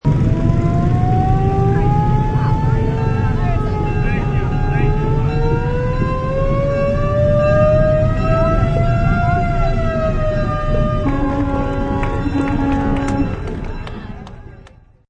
Classic Fire Truck, Old school fire truck drives by; Trucks; Vehicles